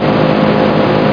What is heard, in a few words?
hoovertank; Hostile